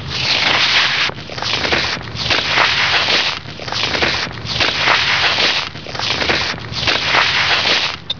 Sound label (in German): SCHLEIFE